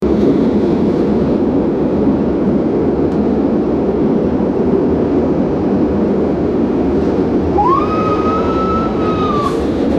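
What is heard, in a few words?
many real passengers inside, flight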